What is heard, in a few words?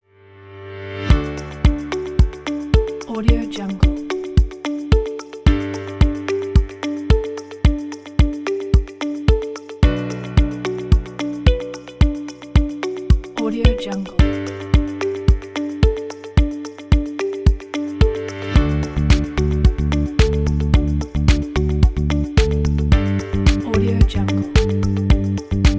bright inspiration and calm corporate